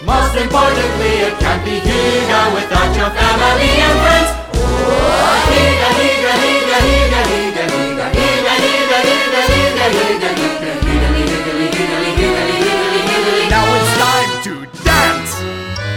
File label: hygge audition vocals